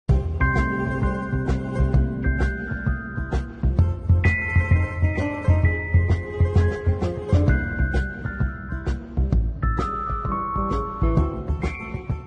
Musica Bar Oficial; mundo gaturro

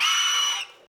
Conejo; el chavo una feria en la vecindad; ios mobile gamerip 2012

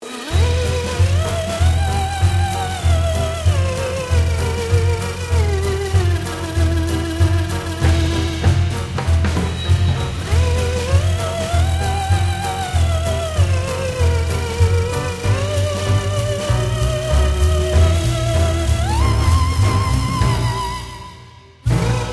LOOP MONSTRUO SERPIENTE TEMA

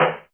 Madera; El Chavo: Una Feria en la Vecindad

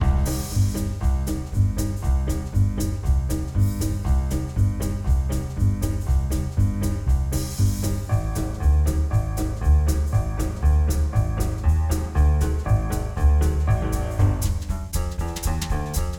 Pollo loop simple

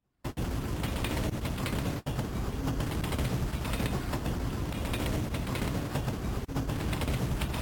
Metro loop

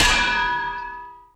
Recibe Golpe; el chavo una feria en la vecindad; ios mobile gamerip 2012

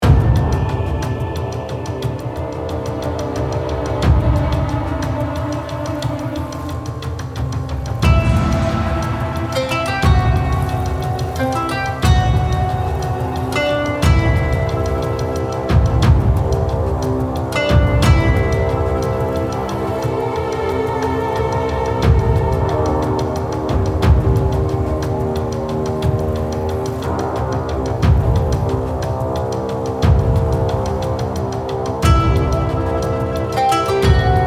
Hielo Exploracion Variacion

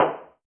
Madera; el chavo una feria en la vecindad; ios mobile gamerip 2012

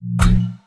Shield aparece

